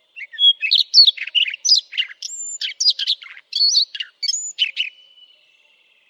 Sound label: siberian rubythroat